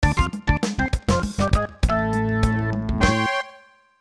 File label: Rocket Belt - Landed Outside Target